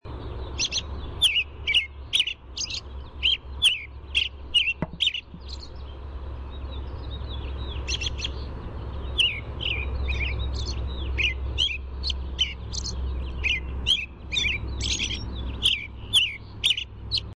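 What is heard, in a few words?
American Robin chirr song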